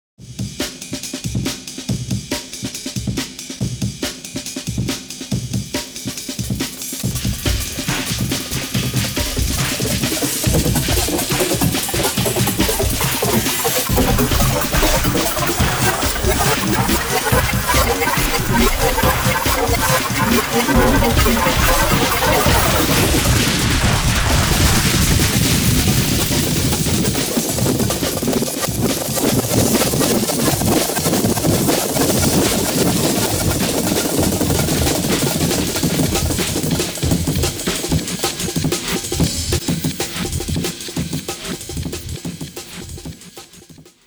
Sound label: amen